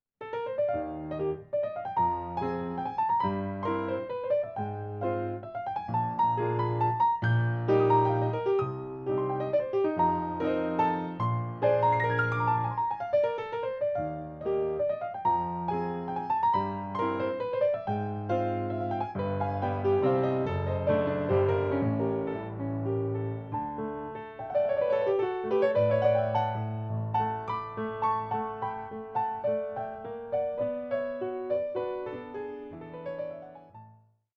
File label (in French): Valse; Mel Bonis